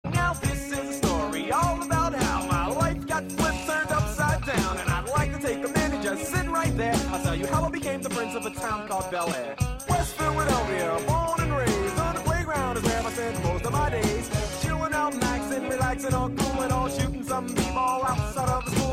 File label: Tring Tring